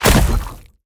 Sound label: impact; smite; Anhur